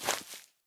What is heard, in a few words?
grass, dig; HunterZ G4; minecraft